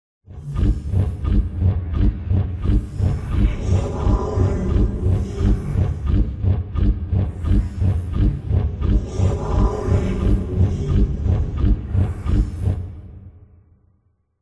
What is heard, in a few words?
robotic power surges sci fi robotic robot cyber futuristic energy machine drone